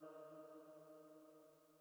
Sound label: Female Pad D#2; KG MegaSaur